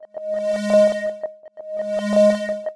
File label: reason syn; neuro; Sinistarr